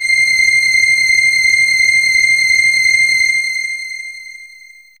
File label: V3 Sus C5, Fairlight CMI IIe, HiString